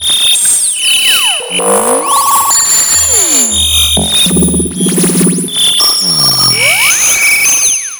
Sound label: Modular, Synth SFX; Minto; Sonic Foundry (Sony Creative Software); The Electronic Music Manuscript Collection